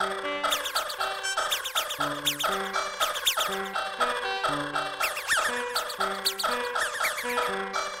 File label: Cartoon